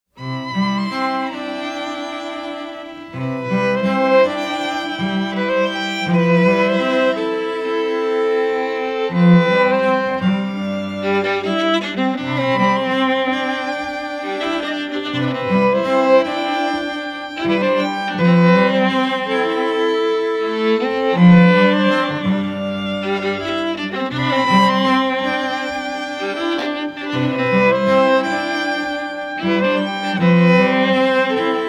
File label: String Trio